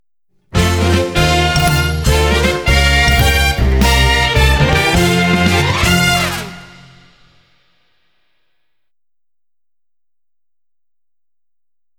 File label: Boss Clear Fanfare; Super Mario 3D World